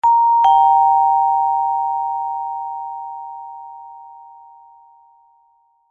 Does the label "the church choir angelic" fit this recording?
No